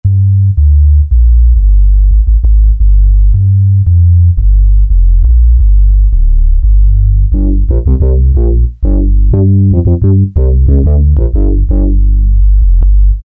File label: Bass Deep, E-mu Morpheus; Factory Presets